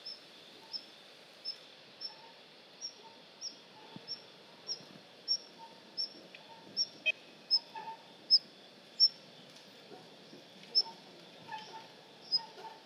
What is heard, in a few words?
Daurian redstart